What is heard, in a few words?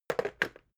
water boiler put down